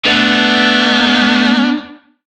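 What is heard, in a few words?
Shawzin Major Chord; Warframe; Lizzie Shawzin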